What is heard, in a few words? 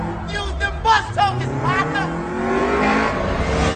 bus tokens